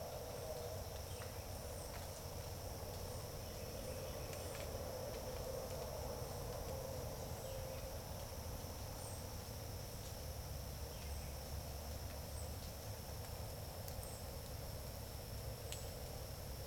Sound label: Vireo and traffic